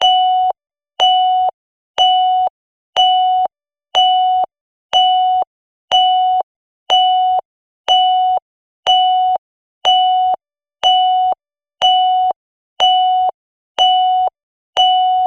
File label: alarm definite; BlackBerry; OS-10